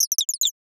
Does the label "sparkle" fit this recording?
Yes